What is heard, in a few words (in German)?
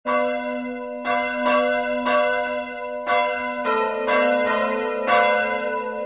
Hochzeitsglocken